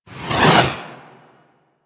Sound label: Leap Whoosh; Warframe; Venus; Venus Terra Moa; Abilities